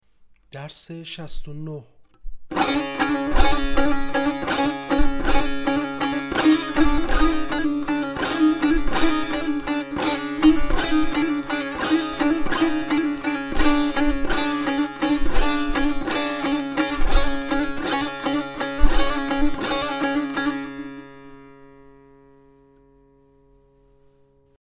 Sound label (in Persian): مشق تنبور